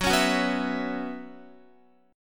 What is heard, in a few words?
Gb 7th Suspended 4th Gb down Guitar; strummed; JGuitar